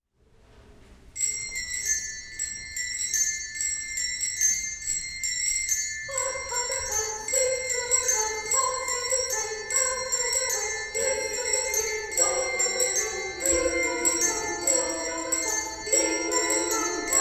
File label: Hark how the bells, Handbell and Sanctuary Choirs; Carol of the Bells; Congregational Church of the Peninsula; Christmas